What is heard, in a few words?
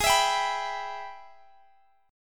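Ab Minor Major 7th Sharp 5th chord, Guitar, Standard tuning; strummed; x,x,18,21,20,19; JGuitar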